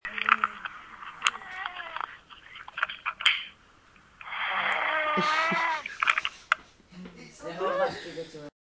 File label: random snoring